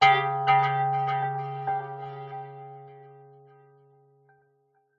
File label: sound design mysterious note single delay; zapsplat